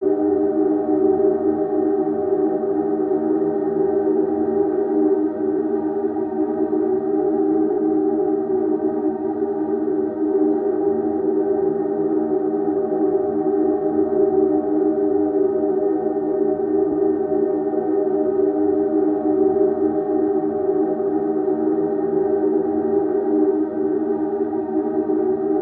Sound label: Ambiance Tonal Dron; drones & tones